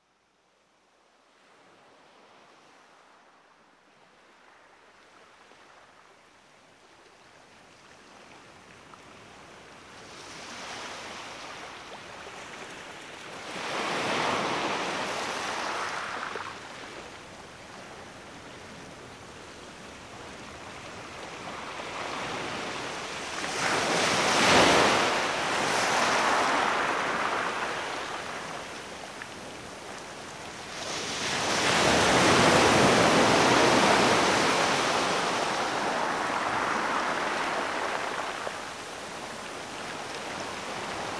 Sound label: Ocean Waves